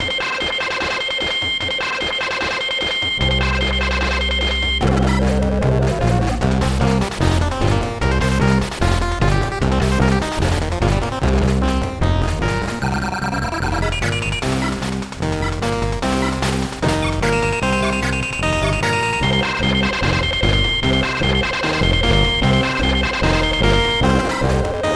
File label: DTMF; Slugbug; Cramley's Atomic